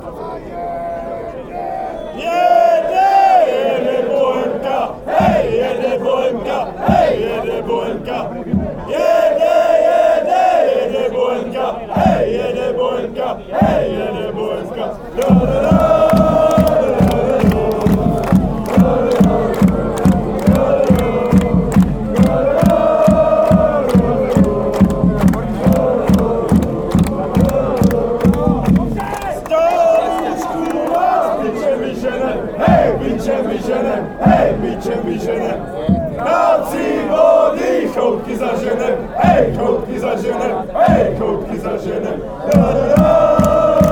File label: bohemians chant, fan chants